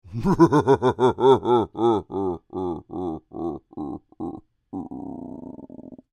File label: Creature, Human, Devilish Laugh; Evil Laughs (Sound Effects:Horror); Big Room Sound